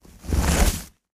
facial tissue pull

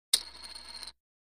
Poker Chip Drop, casino games chip mvmt

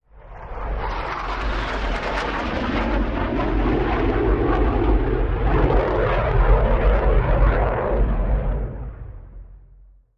AV-8B Harrier flyby

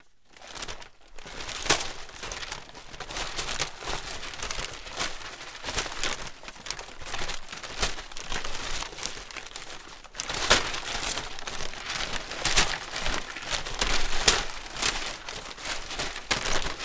Paper Mvmt Lighter

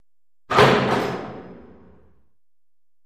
Door Push Bar Locker

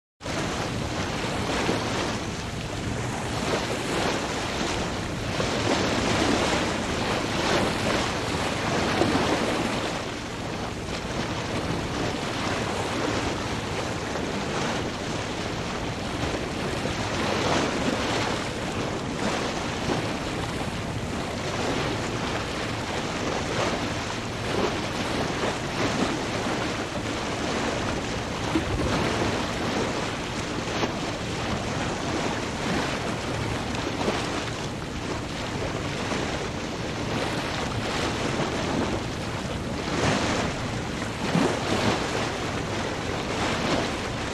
Bow Wash Very Close

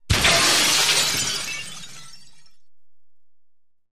Glass Crashes Large W; windows & mirrors

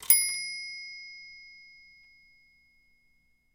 Typewriter Margin; typewriters

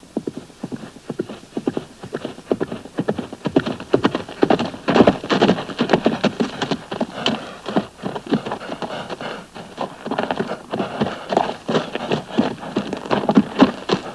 Horse Gallop In Stop

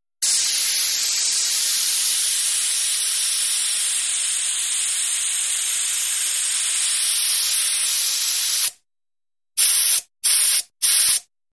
Gas Release Air Tank

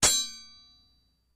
Hammer Hitting Horse, horse shoe

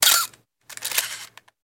35mm Camera Elect Sh; shutters & movement

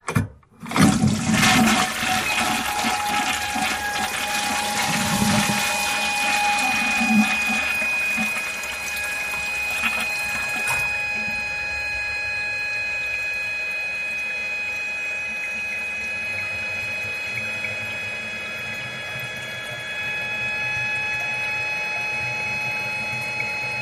Ambiance Water Alar; toilet

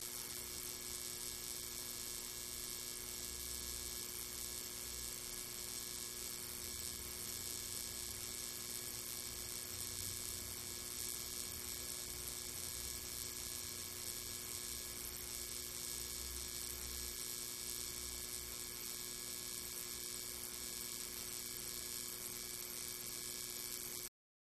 Neon Light Buzz

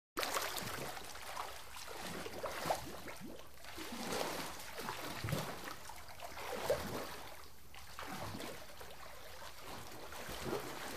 Swimming; Arm Movement In Water.

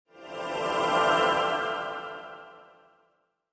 Music Effect Short T, pads

